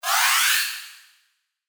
Speed Up; GGJ2022

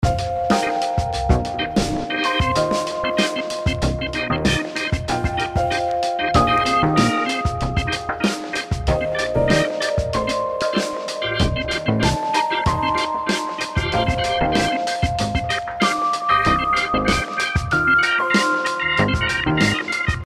Bi Polar Theme Medium; GGJ2022

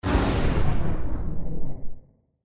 Slow Down; GGJ2022

